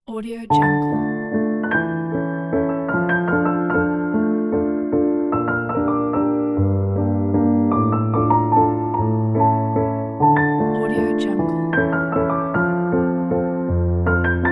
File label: romantic piano waltz